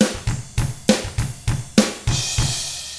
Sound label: ROCK, Drums; ILIO; Double Platinum Drums 1